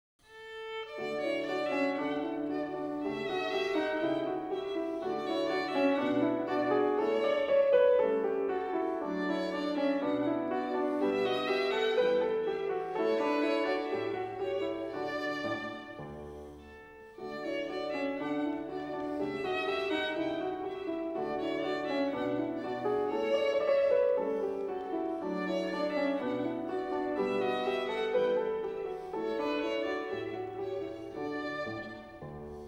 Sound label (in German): Violinsonate F-Dur - Variation V; WA Mozart - The Complete Works 170CD; Volume 4 - Chamber Music-Violin Sonatas-Church Sonatas